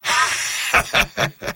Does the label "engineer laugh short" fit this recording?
Yes